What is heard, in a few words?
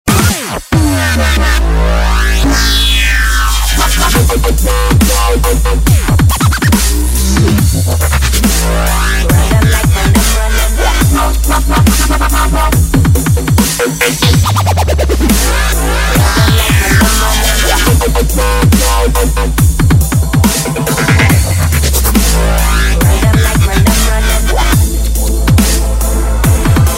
Havana Beats